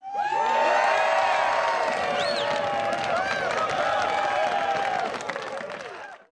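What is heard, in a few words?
Crowd 100 Interior With Whoop; medium int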